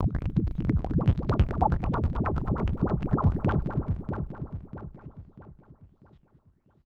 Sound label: TEKNO 140; Techno